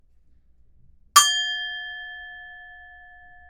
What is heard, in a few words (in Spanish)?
Campana